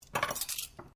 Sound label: Cutlery general pick up, Household cutlery; Kitchen; SoundEffects Foundation2